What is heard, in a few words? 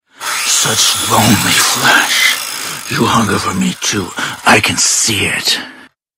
cannibal idle; frank